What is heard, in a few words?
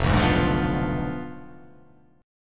F Diminished 7th F down